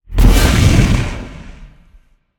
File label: dogs emerge; smite; scylla